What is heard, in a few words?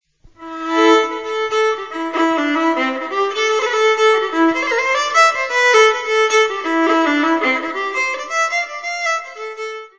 fiddle